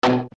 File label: toy ball bounce